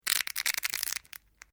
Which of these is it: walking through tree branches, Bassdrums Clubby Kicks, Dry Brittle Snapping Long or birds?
Dry Brittle Snapping Long